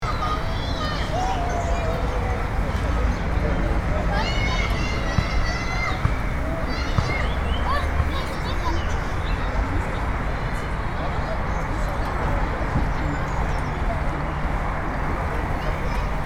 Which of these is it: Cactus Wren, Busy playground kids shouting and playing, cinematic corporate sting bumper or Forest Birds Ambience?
Busy playground kids shouting and playing